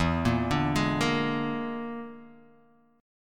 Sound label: E 7th Flat 5th, E7b5 chord; arpeggiated